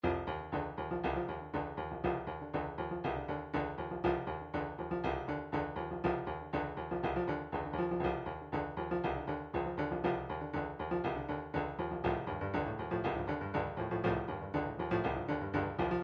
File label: midi drums